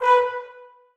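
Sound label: trombones staccato a#4; Sonatina Symphonic Orchestra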